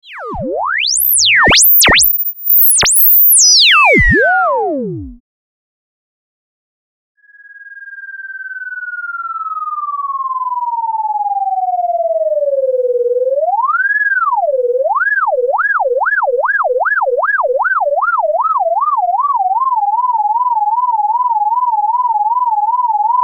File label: EMS Logik